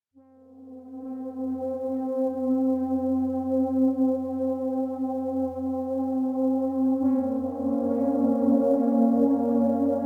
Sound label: Day Amb Stinger